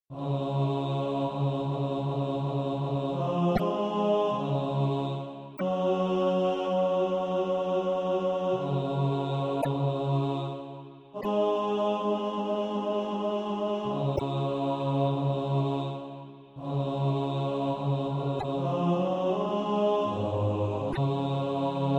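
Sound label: bass, SATB Practise Music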